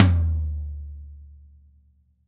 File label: hi tom drum one shot d key